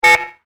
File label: Lo-Fi Buzzer, electronic sound effect; Laser Sound Sound Effects; beeps, bleeps, blips